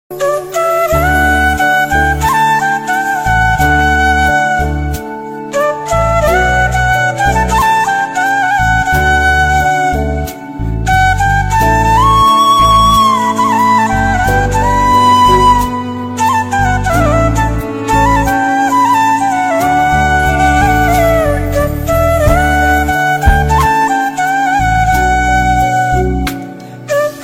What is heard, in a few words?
flute ringtone new bgm flute music ringtone south indian